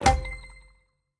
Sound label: explode lion; serengeti kings